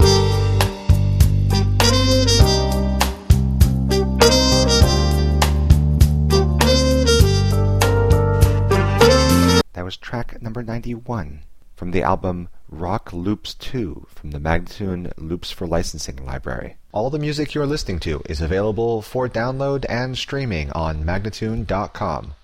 100 G spoken, Rock Loops; Loops For Licensing; MagnaLoops

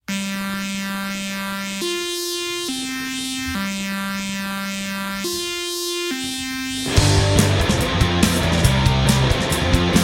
Punk Instrumentals

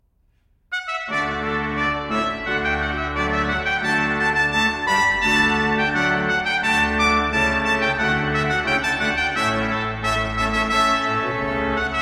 Symphonies de Fanfares Rondeau, Trumpet And Organ; Jean-Joseph Mouret; Sonatas For Trumpet And Organ

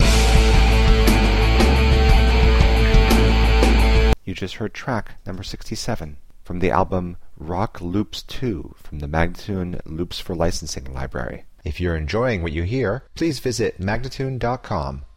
164 A spoken, Rock Loops; spinecar; Loops For Licensing; MagnaLoops

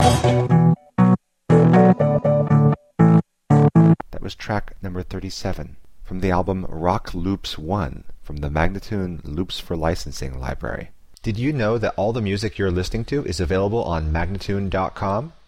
120 B spoken, Rock Loops; Loops For Licensing

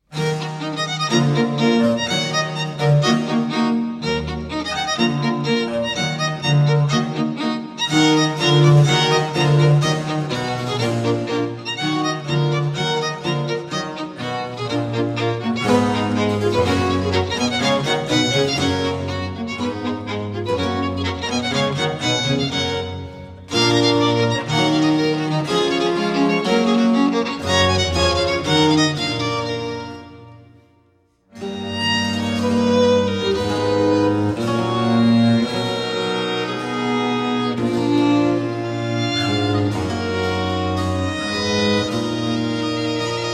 Intrada (Allegro - Presto - Adagio), spoken; Arien (C 52); Simplicissimus Ensemble

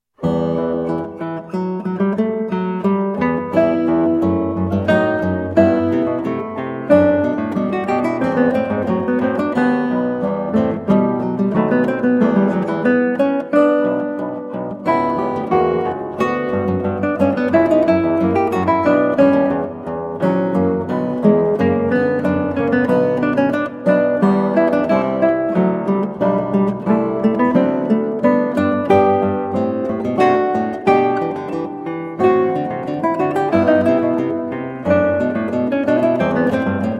Andante, guitar, spoken; Partita 5 in E Minor TWV41 E1; Georg Philip Telemann

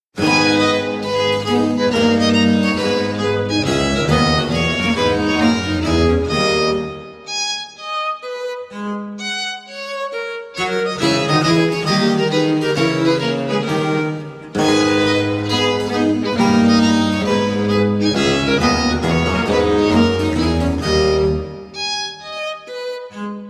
Gagliarda (Allegro), spoken; Mensa sonora Pars III (C 71); Simplicissimus Ensemble

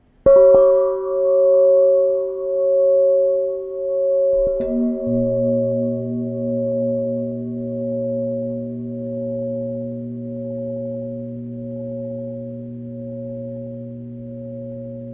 Garden Chimes, Viola

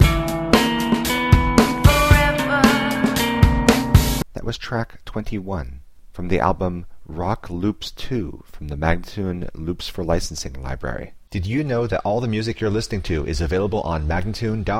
114 E spoken, Rock Loops; Loops For Licensing; MagnaLoops

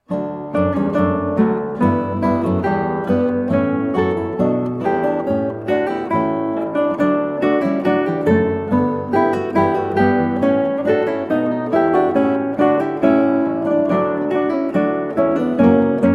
Soave, guitar, spoken; Trio Sonata in D Major TWV 42 D4; Georg Philip Telemann

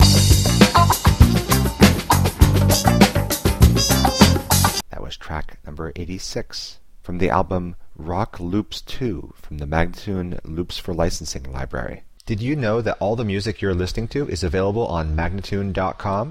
100 E spoken, Rock Loops; Loops For Licensing; MagnaLoops

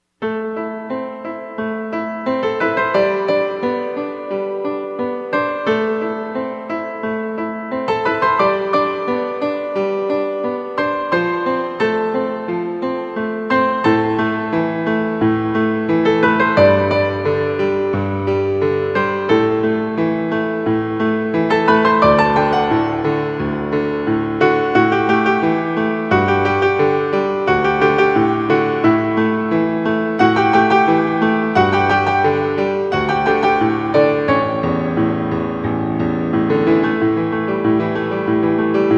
Prelude in A Minor, Solo Piano

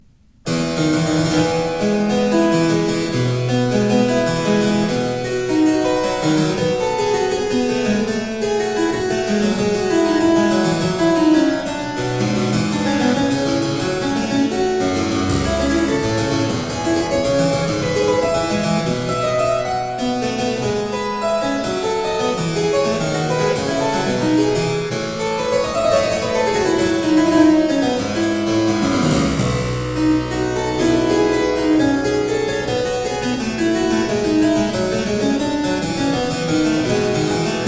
Prelude Suite for harpsichord in F major; A Choice Collection of Lessons; Henry Purcell

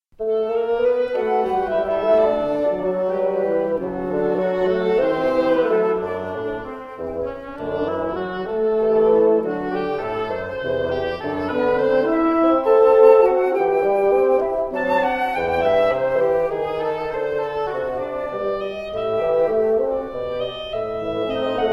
Prelude, wind quintet; Prelude no 1; Ivor Gurney arr Christopher Britton; New arrangements for wind quintet

